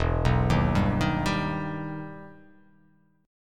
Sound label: E Minor Major 7th E down